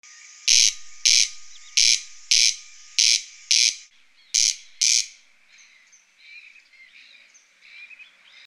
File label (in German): Stimme des Wachtelkönigs